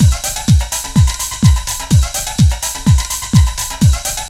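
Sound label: drum loop; X-Static Goldmine 3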